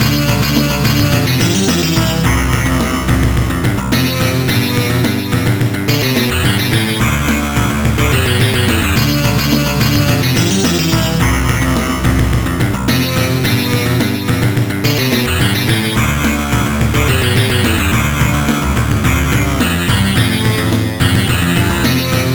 Metal; Dylan Dog The Murderers; Amiga

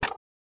Special Click Sound; starbites

